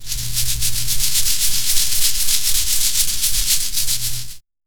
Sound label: waving palm branches